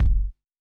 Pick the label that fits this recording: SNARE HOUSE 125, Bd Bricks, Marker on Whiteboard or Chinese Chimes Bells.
Bd Bricks